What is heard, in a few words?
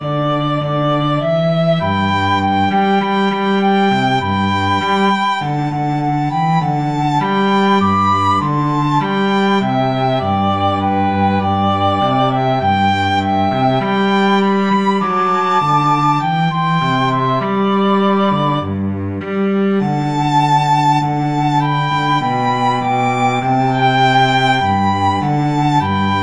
trio, flute violin cello